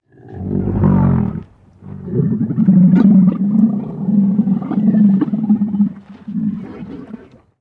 Camel Call Grunts; camels

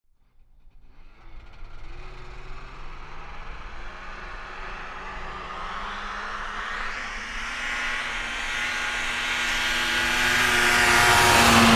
Snowmobile; 550cc Engine Fast Bys.

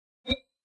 Button Elect Zip; buttons

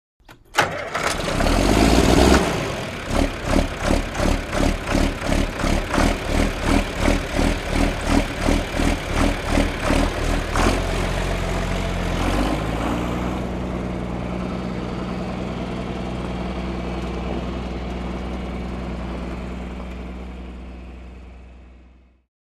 Tractor Start Away, tractor

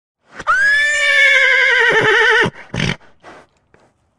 Horse Whinny Grunt Si

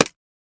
Mouse Trap Dull Snap

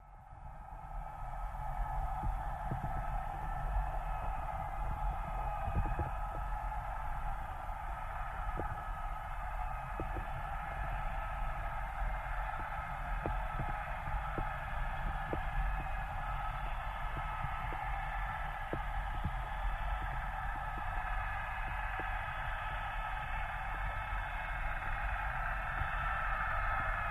Torpedo Jet By, torpedos

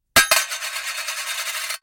Coin Drop Spins Coin D, coins

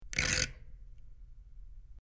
Metal Dial Rusty Metal, switch clicks

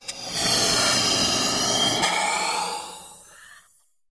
Hydraulic Release, pneumatic

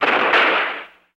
Car Bottoms Out Short

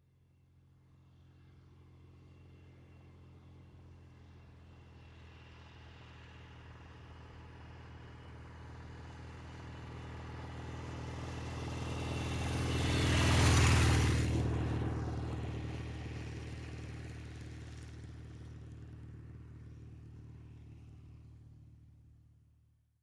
Motorcycle, Norton, By ( 25 Mph ).

